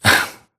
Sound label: grunt hits; Titanfall 2